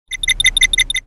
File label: ominous beeping